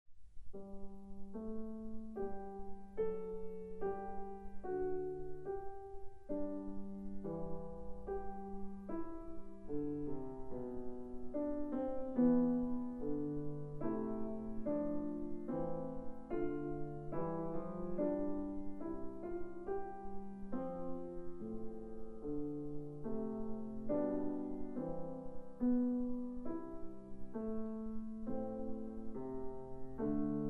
Titelouze Veni Creator piano

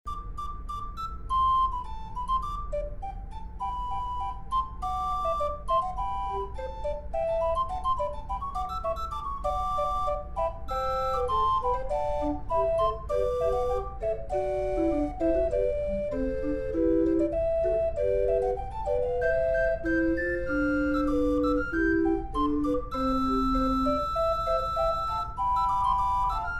Canzona inside pipe room; Tunder

